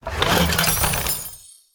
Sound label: Master Chest Attack